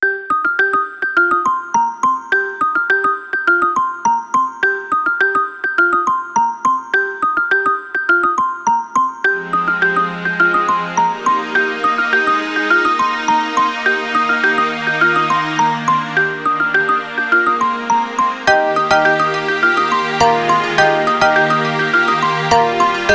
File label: Dreamers Alarm; PlusFourSix; Lars Edvall